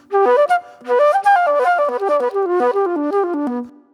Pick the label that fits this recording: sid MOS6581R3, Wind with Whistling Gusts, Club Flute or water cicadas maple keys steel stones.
Club Flute